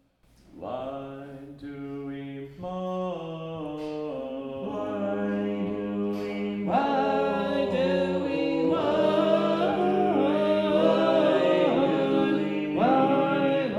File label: small group words only, Sacred Harp; Consolation